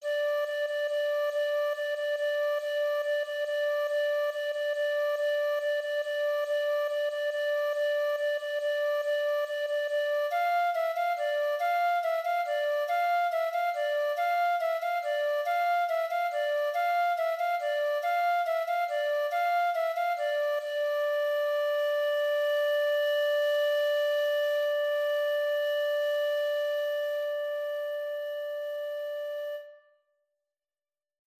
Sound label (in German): Floete, 140; Carol of the Bells